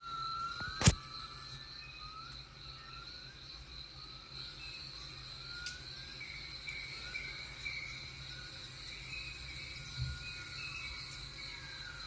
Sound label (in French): Ambiance; Odyssee de la sante mentale; capgemini